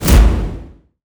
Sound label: Swish